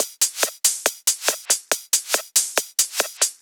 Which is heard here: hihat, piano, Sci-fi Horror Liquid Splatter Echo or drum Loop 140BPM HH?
drum Loop 140BPM HH